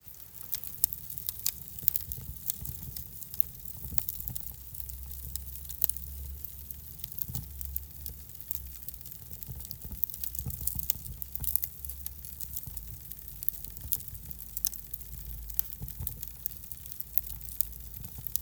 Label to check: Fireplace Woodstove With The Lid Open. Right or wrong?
Right